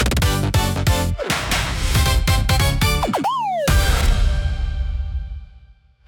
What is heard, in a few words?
nakama tojo sanjo jingle short; free jingles